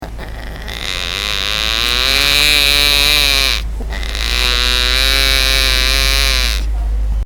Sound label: tigercub; animal sounds